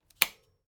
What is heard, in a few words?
Bicycle bike gear shift